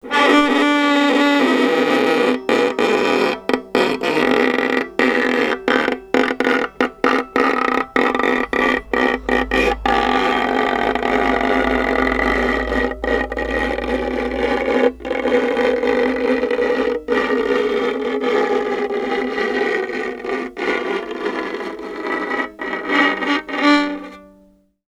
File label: Violin Extended Technique